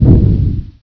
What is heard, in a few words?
Turbine Combustion Surge